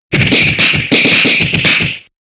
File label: fight